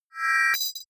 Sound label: confirm swell click; soundpool